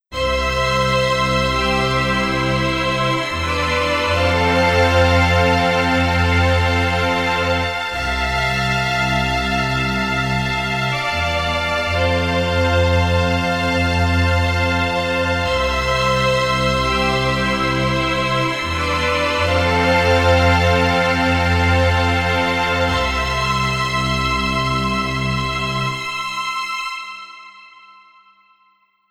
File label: Strings